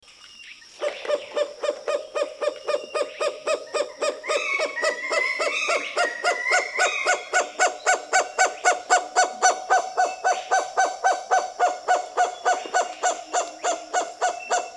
Kagu; پرنده کاگو

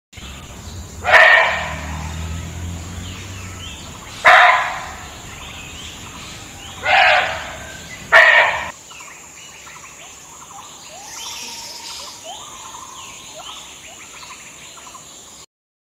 Saola; شاخ دوکی

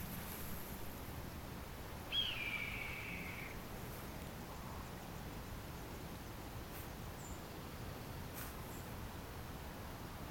red tailed hawk; عقاب دم قرمز

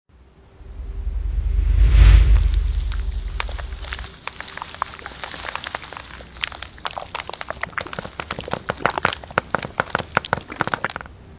hand cream; کرم دست

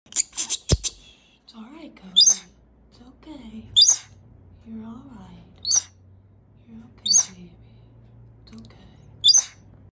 Kinkajou

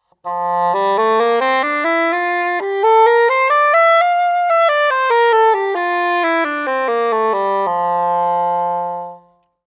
Cor Anglais; کر آنگله

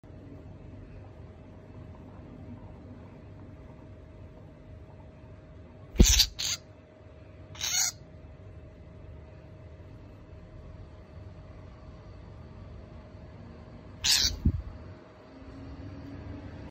Woodchat shrike

